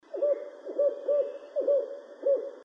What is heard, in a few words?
Strix fulvescens El Triunfo, Chiapas